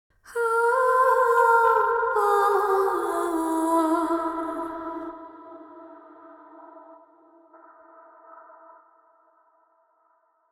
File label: bright sigh